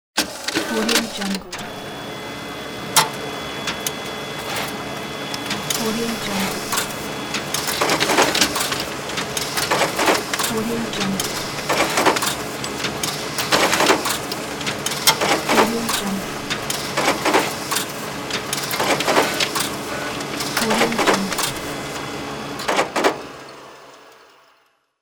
photo copying machine

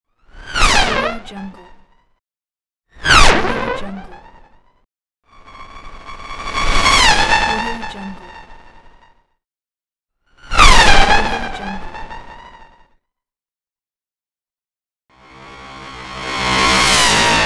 noise motion elements; kaelv

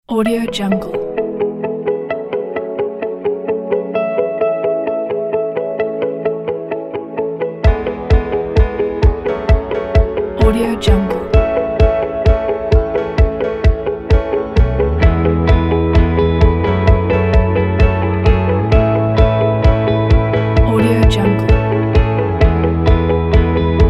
inspiration for succes

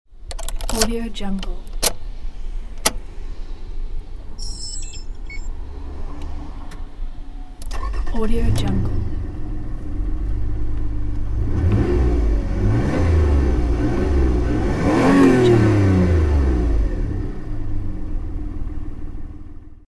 starting car starter; koreli777

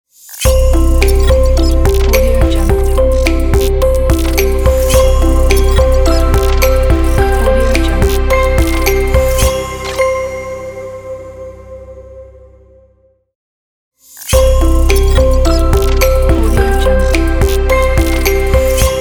technology inspiration logo

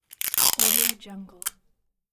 gift wrap tape dispenser

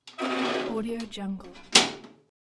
open and close mirror cabinet

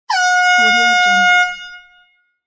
airhorn

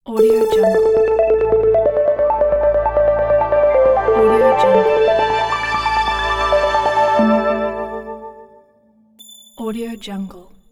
newscast logo

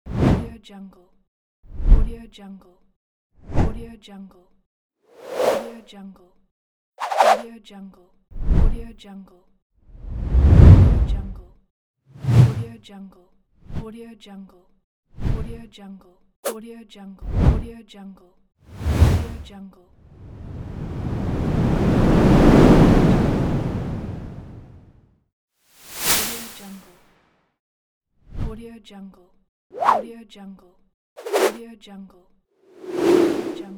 swooshes

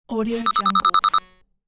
operational signal

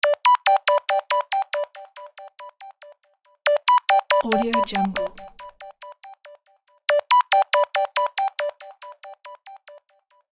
scifi ringtone loop

